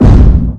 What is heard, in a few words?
bomb bounce; pod skyrace